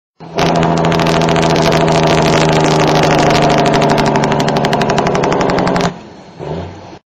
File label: Jake brake ringtone